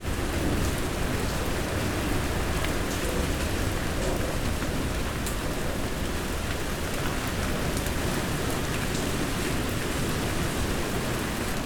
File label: weather wind rain suburban street